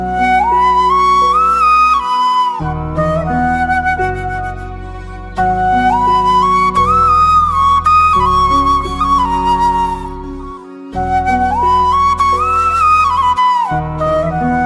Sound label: flute ringtone